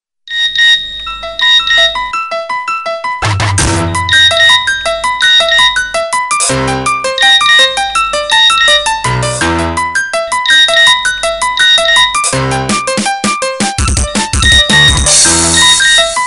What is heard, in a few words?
sms remix clock